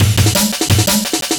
amen; Amen Breaks

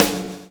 PULL, Backbeat; Spectrasonics - Backbeat

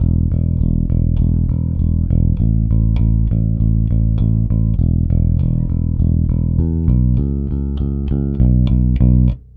Rock, Bass; Bass X

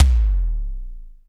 BD, Bassdrums, Roland TD-20

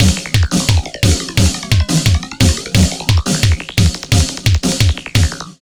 loops 175 bpm; SPEED KILLS; Masterbits - Tekkno Trax

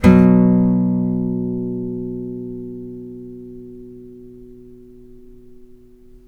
5TH B2, Blues Guitar Samples; ILIO; Fretworks

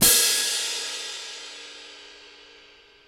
BIG BRASS; OMI - Universe of Sounds

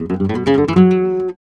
Flamenco Guitar Picado, f#; Best Service ProSamples vol.46 - Flamenco

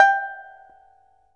PIPA G6; PIPA TREMOLO; Spectrasonics; Heart of Asia

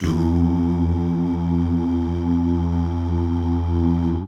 DUUH

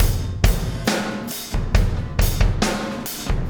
Impact Crater Beat, Processed Drumkits

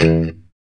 POP E2, STRAT guitar; Spectrasonics; Hans Zimmer Guitars VOL-1; JC-STRAT POP

